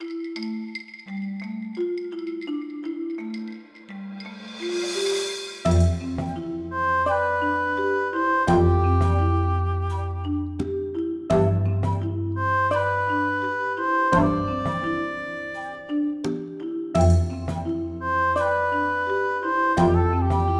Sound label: Dungeon Forest